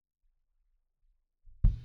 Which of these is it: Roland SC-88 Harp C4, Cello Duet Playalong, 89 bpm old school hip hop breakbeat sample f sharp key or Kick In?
Kick In